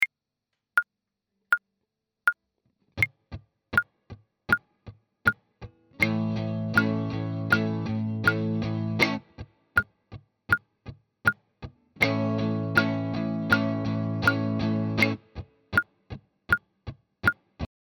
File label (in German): Basic Achtel Groove 80 BPM